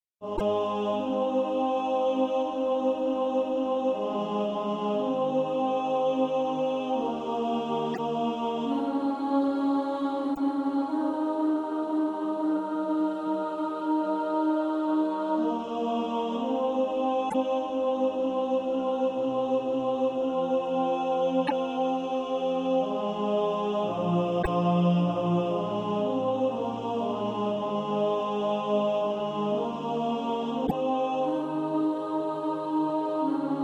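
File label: tenor, Practise Music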